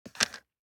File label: DICE on Plastic, Grab, Standard, 5 Five Dice; Samples; ArticulatedSounds; DICE Sfx Party (Extended)